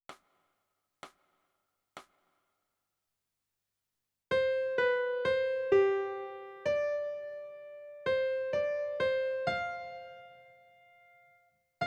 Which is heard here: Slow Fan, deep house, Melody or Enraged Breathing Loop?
Melody